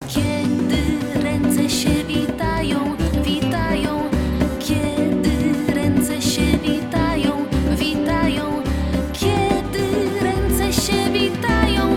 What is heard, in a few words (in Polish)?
Magiczny klej wokal; sklep klanza